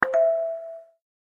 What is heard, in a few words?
chat message receive